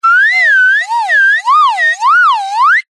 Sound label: cartoon slide whistle ascend climb wobble